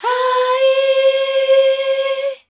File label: MEL C2-R, Voice Spectral